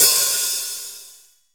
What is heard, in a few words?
soft sounding open hat sample e key, Royality free open hi hat tuned to the E note